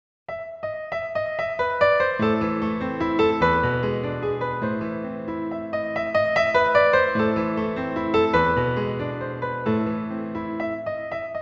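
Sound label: classic piano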